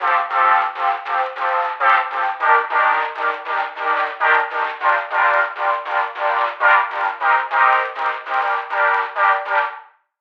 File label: Chords Rhythm Lo-Fi; midi wizard 2; Unison